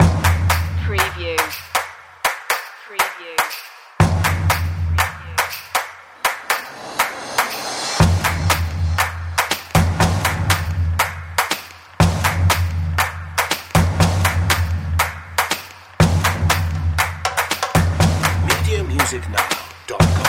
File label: energy drums